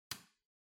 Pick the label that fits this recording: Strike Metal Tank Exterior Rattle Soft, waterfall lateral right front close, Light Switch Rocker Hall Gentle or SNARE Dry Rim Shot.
Light Switch Rocker Hall Gentle